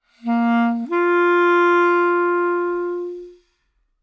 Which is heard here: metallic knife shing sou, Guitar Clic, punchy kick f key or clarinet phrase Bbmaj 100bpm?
clarinet phrase Bbmaj 100bpm